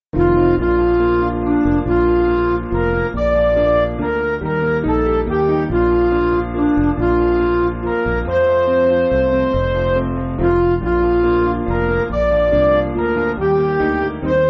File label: With Friends On Earth, Midi